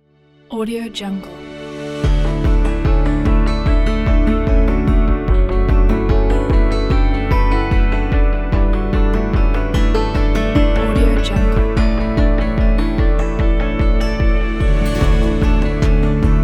upbeat and inspiring ambient corporate

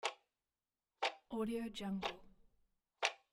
clock ticking loop

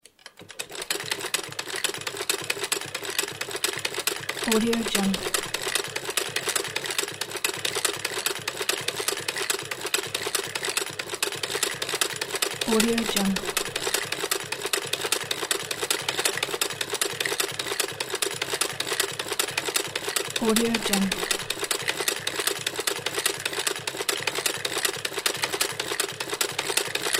vintage sewing machine

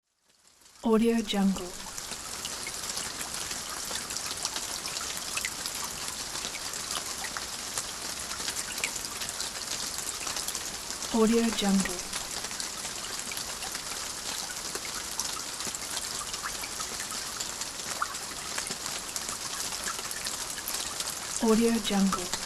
soft rain; leksab

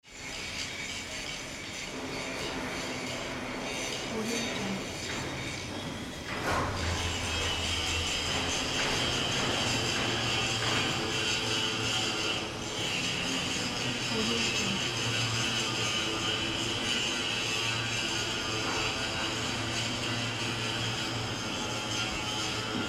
shipyard repair section ambience

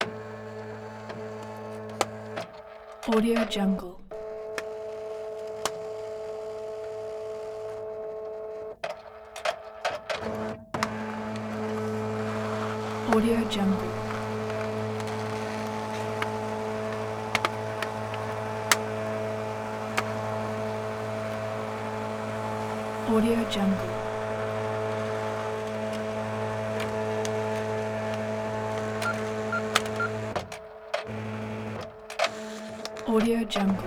fax machine operate; blast drive 30

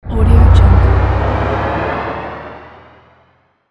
synth up riser

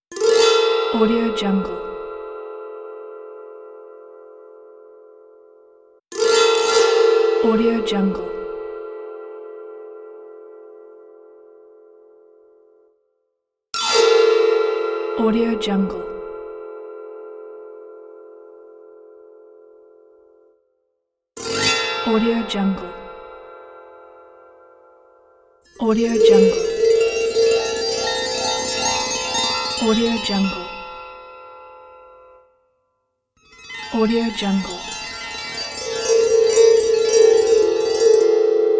fairy tale pack

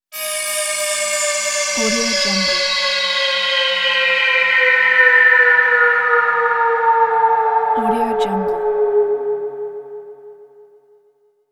scifi ambient

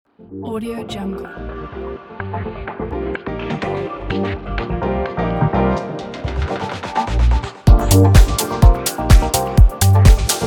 techno house dance

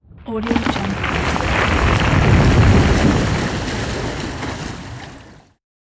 iceberg crashing

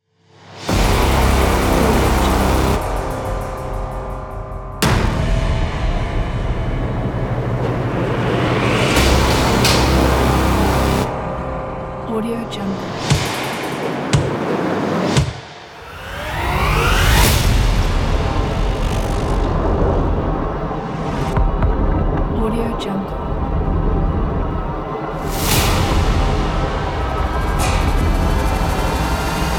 trailer magma

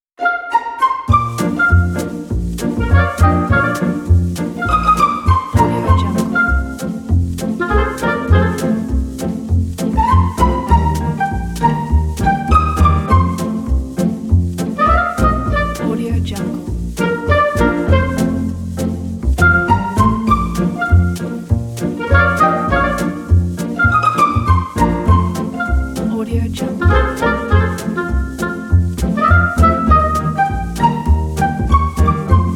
light funny music; olimusiccompany

